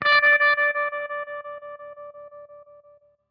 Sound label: class menu, music; Garry's Mod; tf2